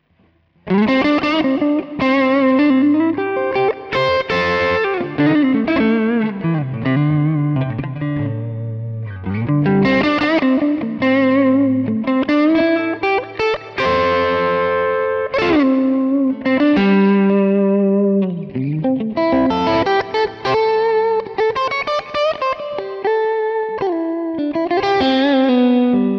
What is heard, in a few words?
breakup neck to bridge; Sire Larry Carlton S3